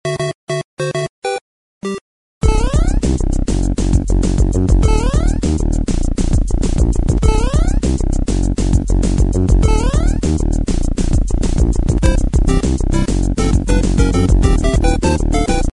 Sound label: techno ringtone; super mario